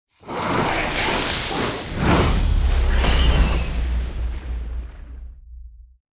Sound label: hell Character Feature; netent